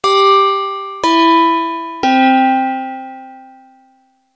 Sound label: 3 klang Tinkle Bell; echo